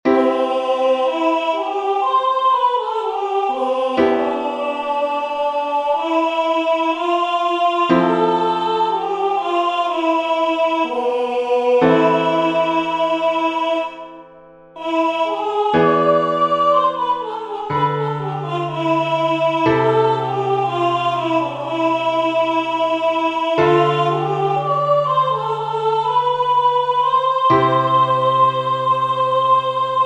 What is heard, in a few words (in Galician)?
entoacion; Melodía e acompañamento; Exercicio 3